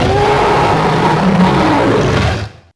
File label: Spino Roar, Dino